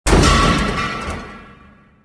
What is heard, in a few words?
pipe break; movers objects